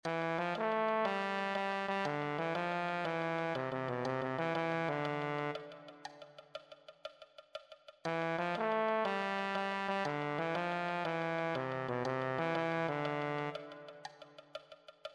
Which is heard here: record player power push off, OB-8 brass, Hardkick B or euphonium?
euphonium